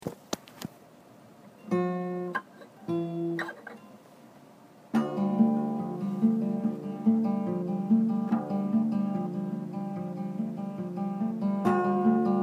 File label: Guitar A3; Republic Hall Dorm Room, quiet afternoon